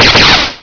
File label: JACKPOT